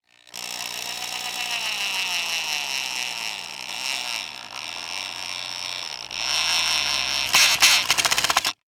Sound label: Industry Tool Drill Power Drill Screw Into Wood